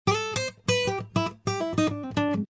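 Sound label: acoustic guitar jazzy lick A; Lounge & Reggae; Loopology; Be Bop Baby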